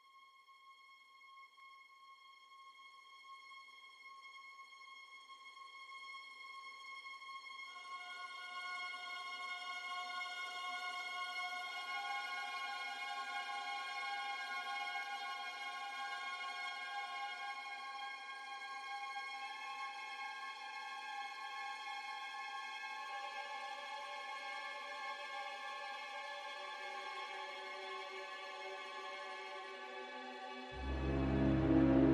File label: orch drama dark strings; soundtrack